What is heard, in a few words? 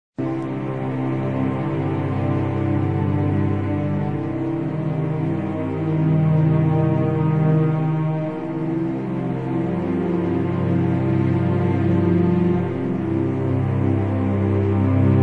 music